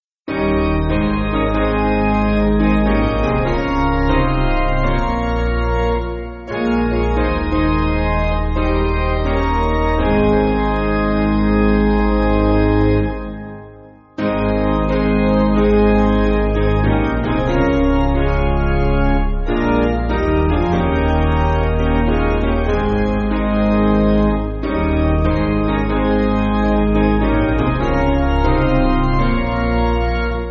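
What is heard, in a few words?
Piano, Backing Files for Churches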